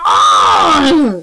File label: ughh